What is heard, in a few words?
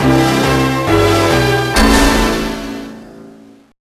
Stage Complete; X-Men: The Official Game; GBA